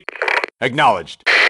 damage, grunt; nimble grunt

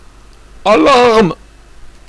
dark alert

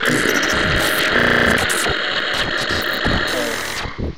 zombie idle; custom monsters; glitchzombie

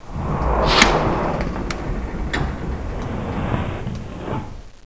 alien door

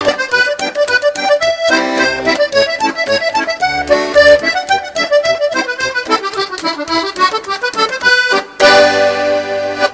hornpipe

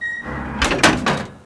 ladder hatch open

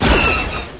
fire

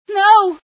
scream, female sci

